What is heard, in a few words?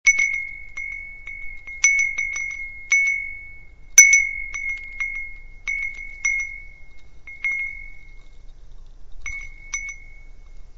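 wind chime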